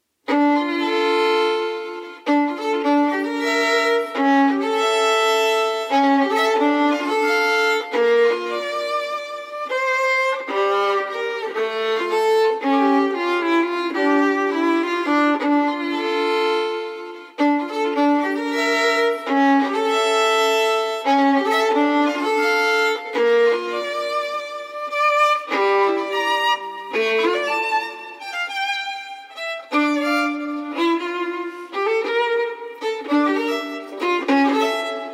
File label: Solo Violin, D Minor; Partita For Solo Violin No. 2; BWV 1004; V. Chaconne